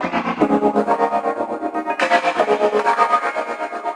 Synth Lectron; Sonic Foundry (Sony Creative Software); Knutrix Drum & Bass

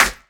Clap; Equipped Music; Loungin House

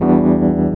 E.PIANO, KEYS

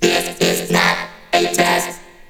VOX GENERAL; Megabass Remix! 2

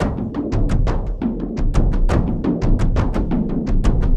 Kendang Down; Sonic Foundry (Sony Creative Software) - Friendly Fire; Drums; Long

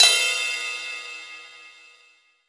Crash Phrase, Roland BK-5 Cymbals

